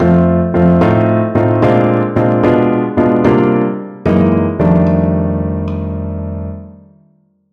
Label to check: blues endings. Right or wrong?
Right